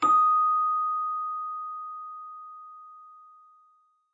chime

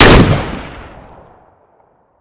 mag7, weapons

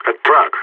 truck

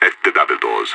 double doors; IDF

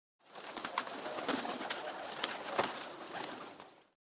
pigeon

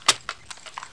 lsat coverup, weapons

